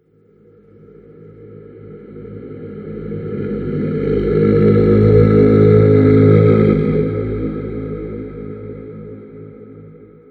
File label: Ghost Of The Cosmos; horror; Ghost Sound Effects